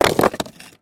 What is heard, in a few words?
rock